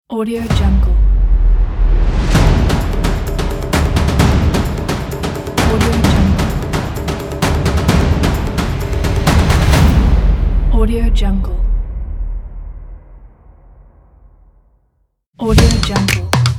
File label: drums logo pack